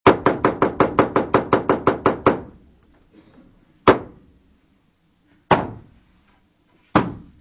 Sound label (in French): battement les trois coups